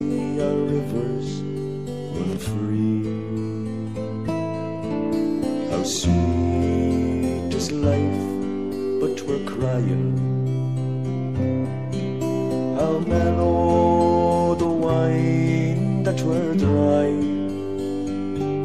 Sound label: best ireland